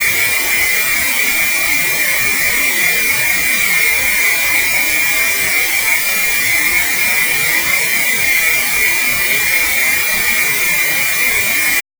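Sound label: noise tone traditional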